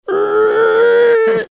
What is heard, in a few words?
making creaking sound